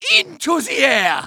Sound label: taunt flip exert; Medic